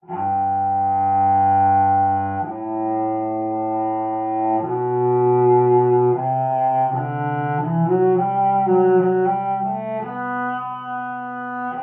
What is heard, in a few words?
Contrabass; Assassin's Creed Syndicate